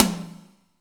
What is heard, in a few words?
Slap; Jungle; Pecussion Hits; Zero-G Jungle Warfare 1, 2 & 3; 90 s Sample CDs